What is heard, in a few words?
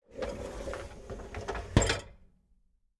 Household Dishwasher Bottom Draw Close With Dishes